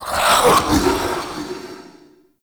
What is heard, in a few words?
Mockery Male Vocal Attack; Characters; Enemy; Vocals; Mockeries